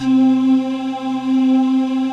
PADS; Pro-Rec - Synthsonic; TR PADS

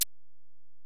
Ac Perc, Drum Machines Alesis DM-PRO

